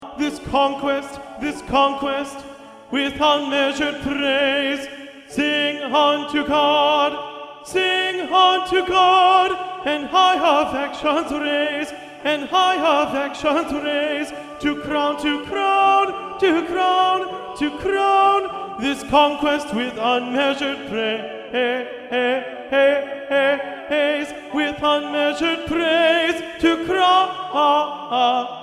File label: sing unto god tenor; honor choir